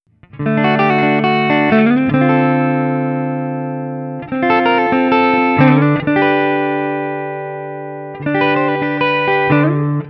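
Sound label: Guild Burnside Crossbow ODS100 D, Clean, D-Shape; Burnside (by Guild) Crossbow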